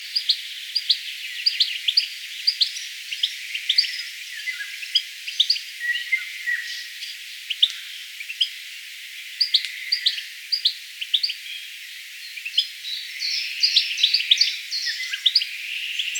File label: goldfinch calls; vogelstimmen